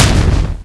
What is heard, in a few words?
bumm